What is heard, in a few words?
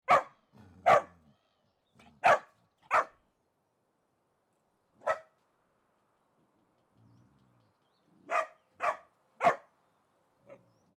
small dog bark; spoteffects